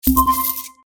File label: money